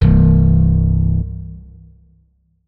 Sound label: notes, guitar; Hideout Furniture